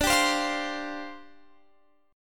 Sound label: C Suspended 2nd Suspended 4th, D